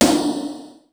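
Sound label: wet flangers; sr16 sd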